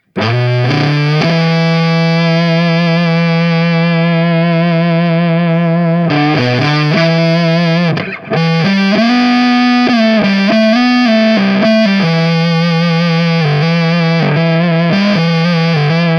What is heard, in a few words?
Kungfuzz compresso